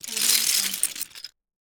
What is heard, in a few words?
lego brick pile pick up